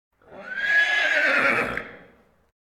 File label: Animal effects snorts grunts farm ambience